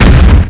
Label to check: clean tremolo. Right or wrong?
Wrong